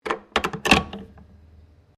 TELEPHONE WOODEN AUTOMATIC DIALING WALLPHONE HANGUP; Various old telephones; Electronics